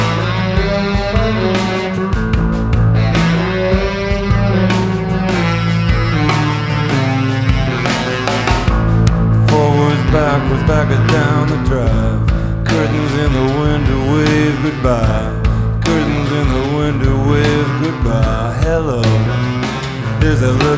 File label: rock